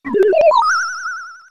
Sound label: mute fail; SNES; peach; SMRPG